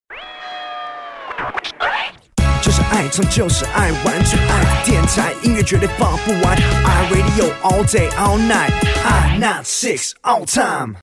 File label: rap music Jingle